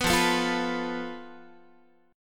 F Major Flat 5th Guitar, chord; strummed

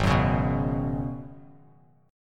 Ab Minor 11th, Bass; Abm11 strummed; JGuitar

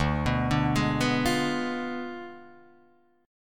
D Diminished 7th D down Guitar Open D

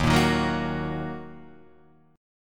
D sharp Minor, chord

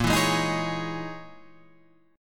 C sharp Minor 6th Add 9th A sharp

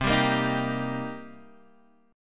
C Minor 7th, Guitar, Half Step Down

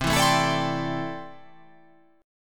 C 6th Add 9th C down

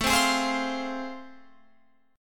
A Minor Major 7th Sharp 5th, Guitar Open F; strummed; AmM7#5; 21,19,20,19,20,19; JGuitar

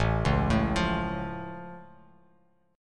A Augmented A down Bass Standard; arpeggiated; JGuitar

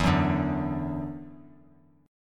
E 7th Suspended 2nd, down; E7sus2; strummed

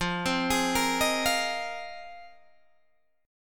F Minor 11th, Guitar Open D

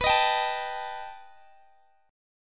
B Augmented 7th B down Guitar Open G

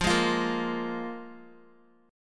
F Minor Double Flat 5th, Guitar; Listen to Fmbb5 strummed

